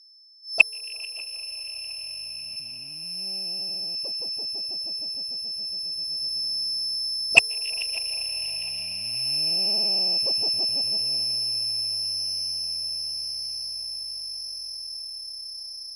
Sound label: Chuckler